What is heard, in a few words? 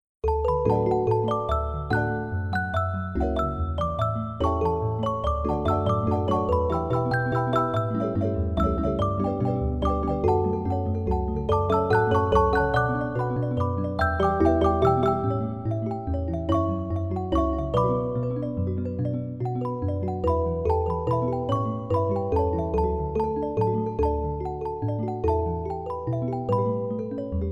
musical box